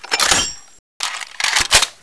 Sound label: m79 reload; cstrike